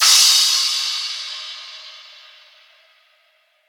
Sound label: Crash; Cymbals; Ghosthack; Color Bass Essentials